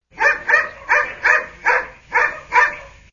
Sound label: Dog Barking